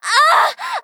Scream, FVoice; rimworld animations

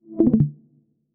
stream ended; discord

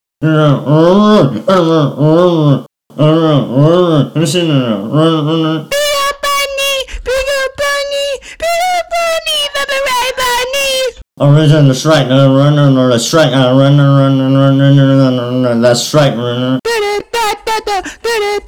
Voices; Tobee Night Funkin PSYCH; philly-nice

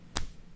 Clap; rimworld-animations

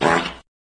fart

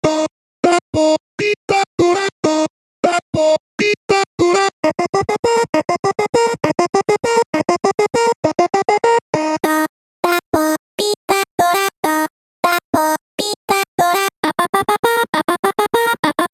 Voices; Tobee Night Funkin PSYCH; cocoa

